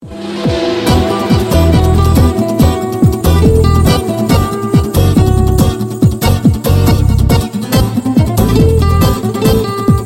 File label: tango guitar